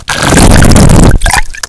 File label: gurp; quake2; bong